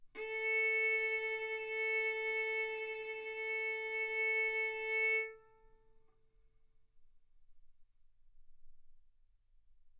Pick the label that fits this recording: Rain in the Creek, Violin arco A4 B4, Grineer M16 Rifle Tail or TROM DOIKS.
Violin arco A4 B4